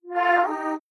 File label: Holy happiness; jibo-cli; behavior-template